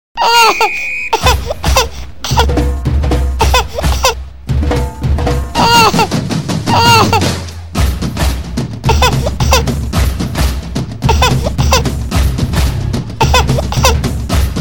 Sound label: baby drum cry; children